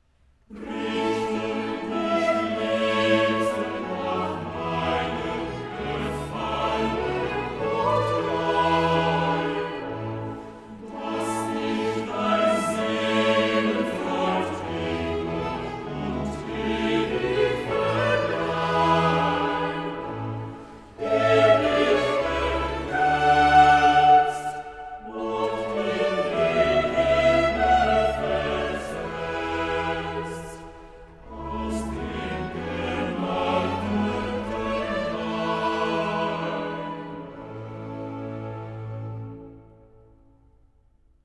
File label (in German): chorale